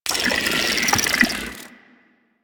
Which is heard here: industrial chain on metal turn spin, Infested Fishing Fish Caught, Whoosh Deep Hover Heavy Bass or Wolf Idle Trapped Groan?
Infested Fishing Fish Caught